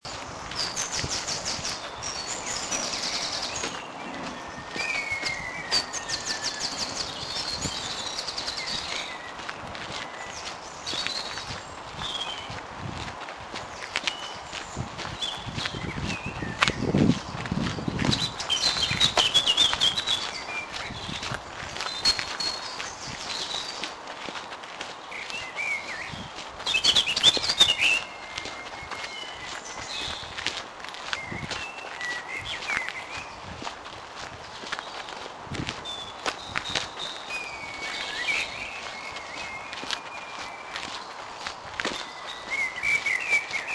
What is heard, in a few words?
birdsong